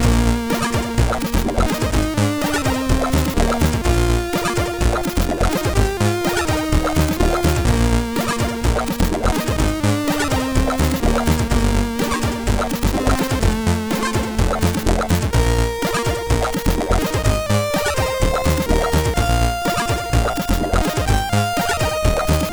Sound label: sid CSG8580R5